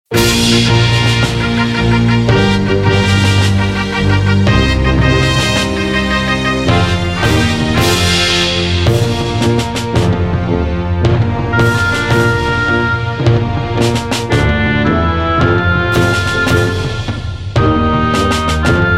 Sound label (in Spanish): himno pista; la nueva estancia; bogota colombia